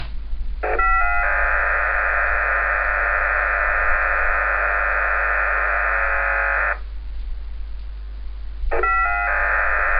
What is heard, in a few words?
Modem Dial Up